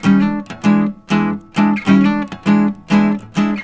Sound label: Blues Guitar Samples, riffs; ILIO; Fretworks